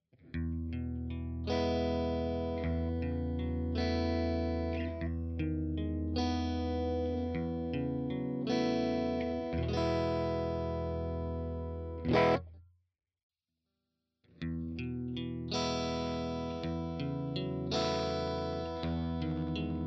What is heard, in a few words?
Woodrow 55 All speakers STOCK